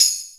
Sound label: Tambourine; Proteus 2000; Digital Sound Factory Proteus 2000